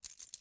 keys step low; DeadFright

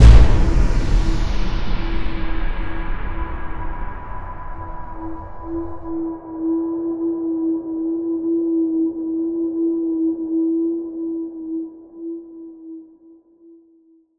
STING Big Bassy Slam, Cinematic Metal Impacts

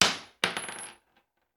Bullet Shell; Sound Ideas; Guns

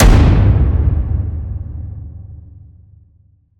HIT Slam, Cinematic Hits Designed

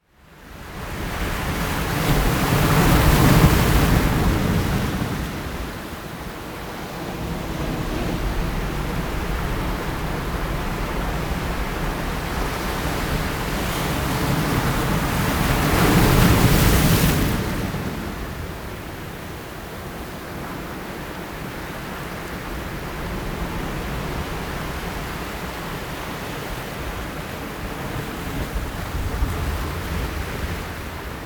sea waves bay; best service Blue Box; sea ocean

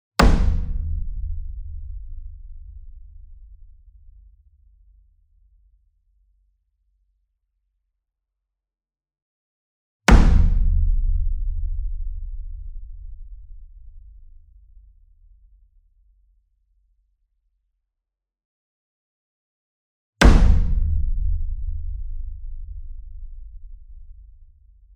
Single Drum Taiko 60 Inch Hit Hard Beater Near; boom library; Cinematic Strikes Construction Kit

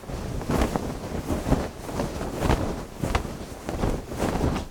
FABRIC Flag or Fabric in Wind Strong loop; mono; Universal Sound FX; CLOTHING

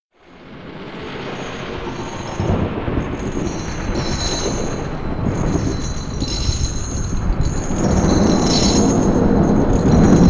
halloween sound effects dragging the chains; Halloween Nightmare CD Sound Effects

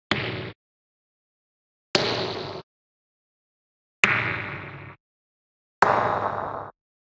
Generic Click Digital

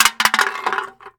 Soda Can Fall; Crashes; 1001 Sound Effects

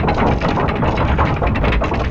Drawbridge Loop; Doors

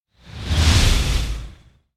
Whoosh Fast Fire Ball By; Hollywood Edge; sound designer's toolkit v2

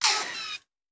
Bullet Pass By; Sound Ideas; Guns

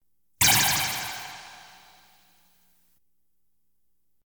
Science Fiction effects, Lasers, Light beams

